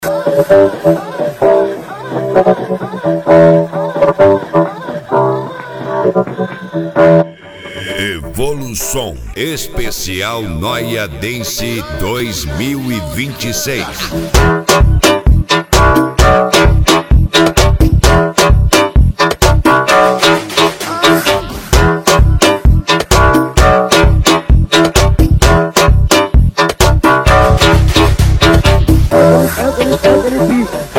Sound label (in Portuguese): noia dance